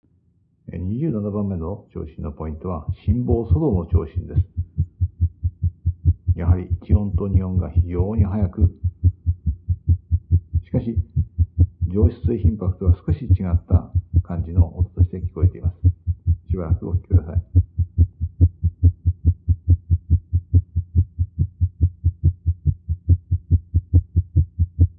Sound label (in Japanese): AFL 心房粗動